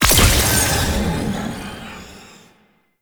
Lore Fragment Collect; Warframe; Duviri Murmur

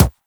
Weapon Punch Pistol; Warframe; Weapons

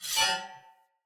Short Sword Whoosh Accent; Weapons; Player

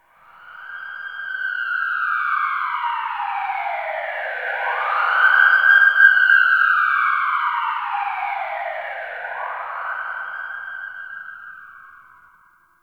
Tunnel Distant Siren

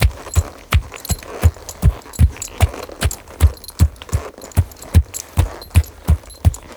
Horse Steps Walk Loop Default; Warframe; Duviri Horse; Vehicles

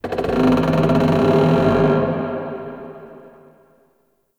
Wood Creak; Warframe; Shadowgrapher

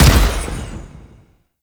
Weapon One Shot B; Warframe; RailJack Weapons; Modular Weapons

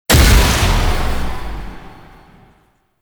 Wrist Gun Alt Charge Start; Weapons; Warframe; Tenno

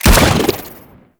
Bubblegun Pistol Shot

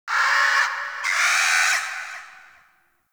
night bird screech; Ambience; Warframe; Eidolon Plains

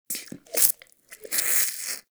Wheeze Close; Ambience; Warframe; Infested Eris

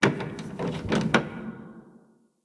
Station Misc, Ambience; Soul Plane; Orlick

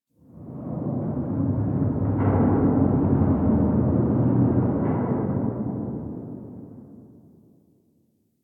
Distant Eerie Metal Bangs; Warframe; Nineteen Ninety Nine

